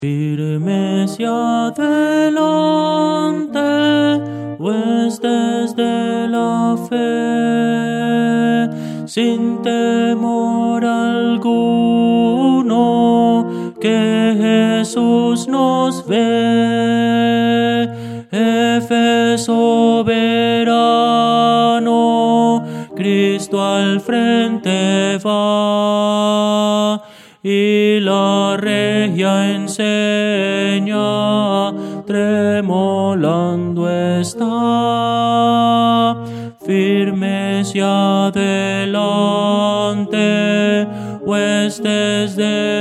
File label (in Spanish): tenor, himno; Voces para coro; firmes y adelante